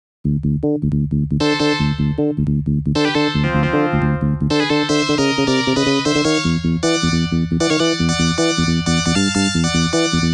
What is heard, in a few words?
Stage 2 BGM